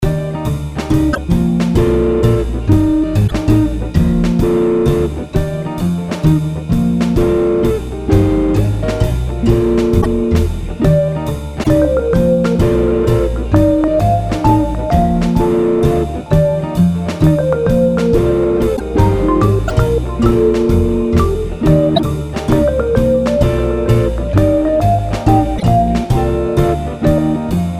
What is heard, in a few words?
instrumental